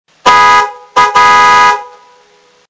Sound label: klaxon 2 tons; CaptainDangeax